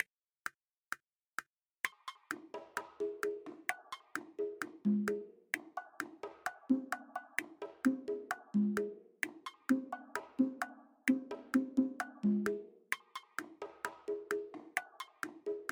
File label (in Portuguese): amostra percussao arrocha